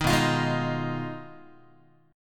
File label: B 6th Flat 5th B down Guitar 4A 4E 5A 5D 5G sharp 6C sharp; strummed